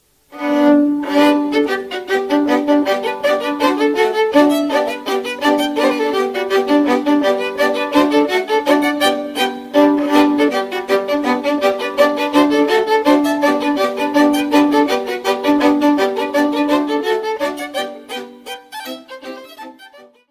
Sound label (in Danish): Trippevals, Danemark